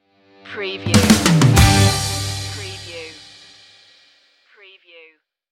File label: joyride stinger